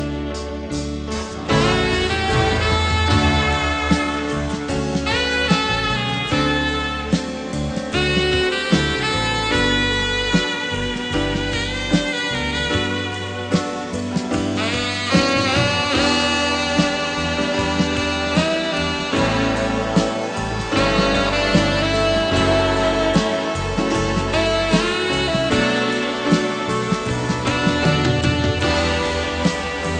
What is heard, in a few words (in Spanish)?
Saxo